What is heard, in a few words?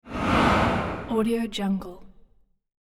fast whoosh by